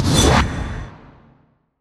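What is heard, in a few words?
level up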